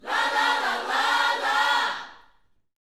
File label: Vocal, Gospel, PHRASE; Spectrasonics